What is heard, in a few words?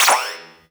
Percussive FX; Vengeance Samples; VEH1 Percussion